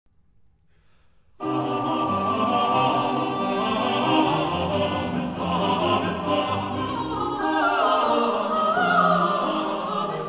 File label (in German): Amen kurz